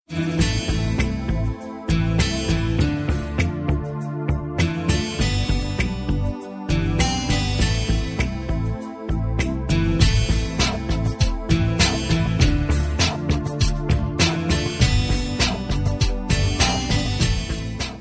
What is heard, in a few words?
soft guitar, rock; ringtones